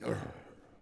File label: Grunt; Civ4 beyond sword